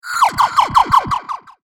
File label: Laser Rifle Rapid Fire, laser gun, science fiction sound effect